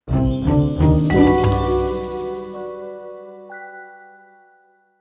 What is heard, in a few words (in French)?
SURPRISE